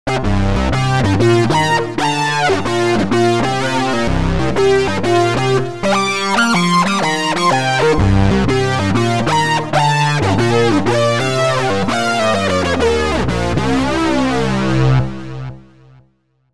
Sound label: Prophet Unisync II; opxpro2